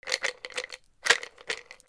BATHROOM

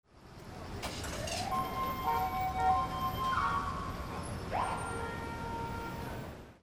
AMBIENCE

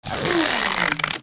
FRICTION

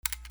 CAMERAS

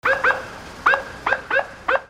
ALARMS

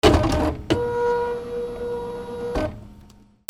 INDUSTRY

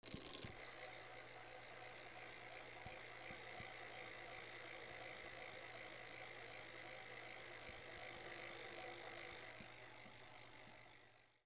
GARAGE

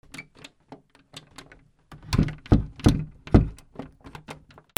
DOORS

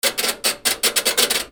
HOUSE

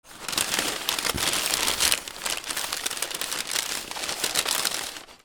PAPER

